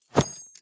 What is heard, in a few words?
deploy end; Valorant; cstrike; raze